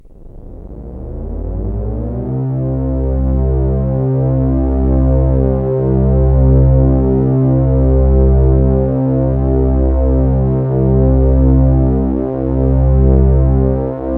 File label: Hmmmmm, CSG8580R5